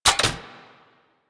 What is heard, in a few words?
spear throw mech; Warframe; Weapons; Corpus; Spear Gun